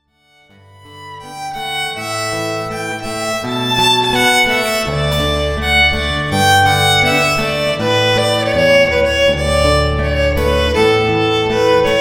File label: Sample Playalong; virtual ensemble